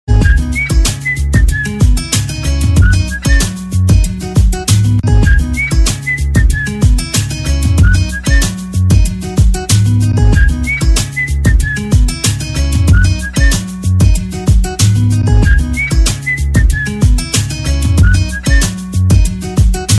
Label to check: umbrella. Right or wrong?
Wrong